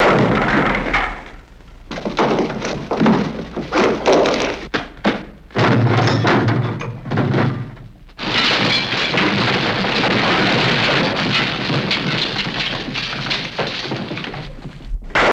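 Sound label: Debris Various Wood Metal, ransack room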